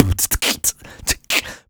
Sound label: Human Beat Box 140 Pop; Loopmasters